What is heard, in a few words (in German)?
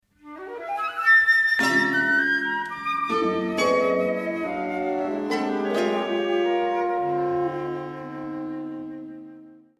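Flötenfigur; Cerha; Langegger Nachtmusik I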